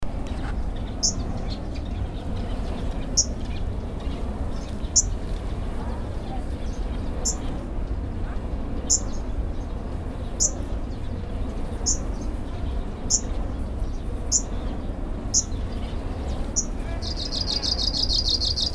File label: warbler pale legged leaf Phylloscopus tenellipes; Shanghai-area; Springtime